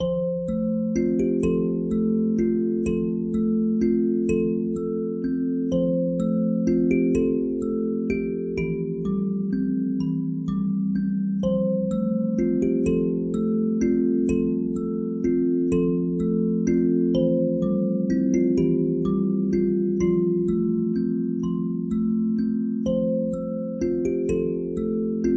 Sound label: Kids Music Baby Schlafmusik, Dozing Off